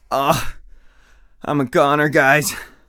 hurt near death; Escape from Tarkov; usec2

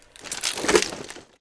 Inf Weapons Foley; Red Orchestra 41-45

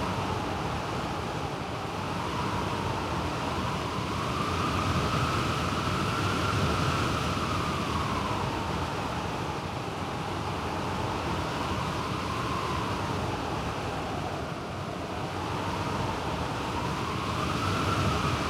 ambient battle; World Of Warships

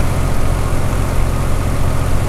spitfire cockpit l, engines; War Thunder

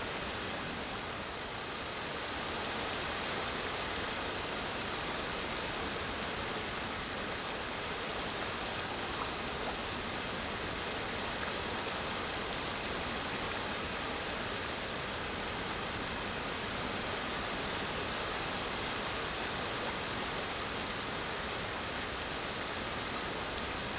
ambience desert day calm rainy; Rust

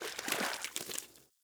fish caught; Rust

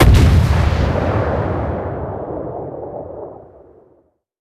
ambient port; World Of Warships; port3d

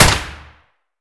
rsass indoor close silenced; Escape from Tarkov

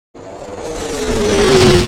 artillery incoming; Explosions; Call of Duty Modern Warfare

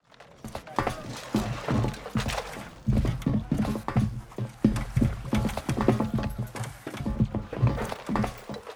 Aircrafts Mi-8MTV2 Troops Loading; DCS

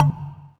cube ball hit

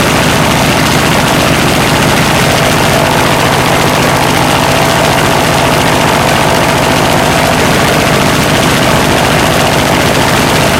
zero side, engines; War Thunder

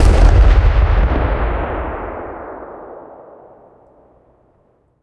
plane; World of Tanks

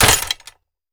crossbow attack; Rust

